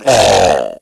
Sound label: die